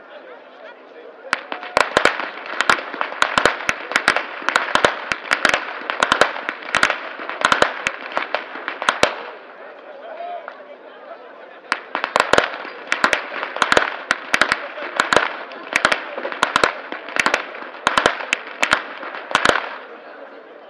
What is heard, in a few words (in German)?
schnalzen, flachgau